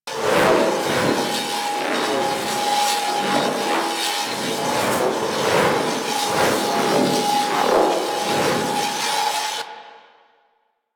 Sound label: Canon Loop; Warframe; Rail Jack; Rail Jack Archwing Canon